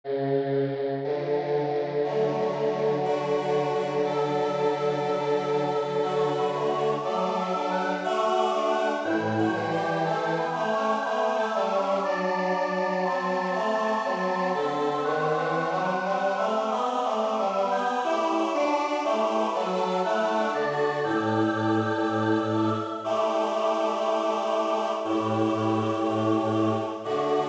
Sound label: bass; Brahms; ich hoffe